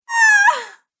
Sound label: Female Scream Victim; Screaming Sound Effects